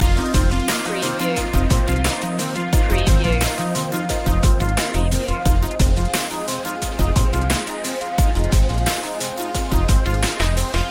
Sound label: over distant shores loop